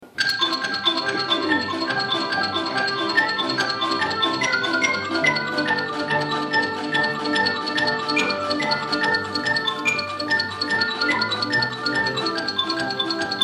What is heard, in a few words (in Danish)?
Xylofon